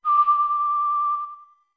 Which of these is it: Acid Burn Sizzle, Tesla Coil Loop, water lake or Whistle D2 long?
Whistle D2 long